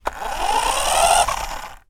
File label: toy car radio controlled drive short distance stop